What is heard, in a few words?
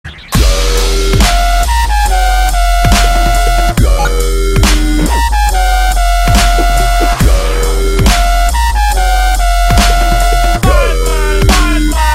Dub Step dubstep; Top Gear